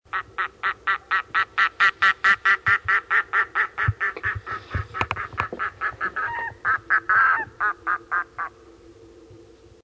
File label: quack, loon